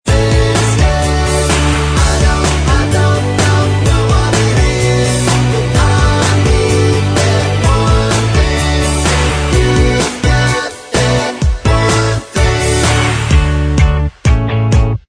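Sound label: backing vocals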